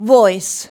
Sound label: VOX; SINGIN`; Sound & Vision - Gigapack II CD 2